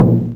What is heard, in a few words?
Tom, Drums; Bitkits